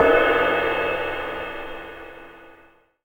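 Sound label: mallet; 22 ZIL MLT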